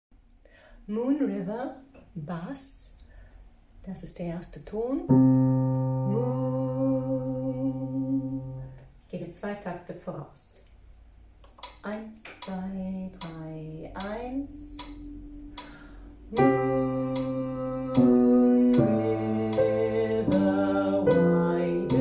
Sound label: Bass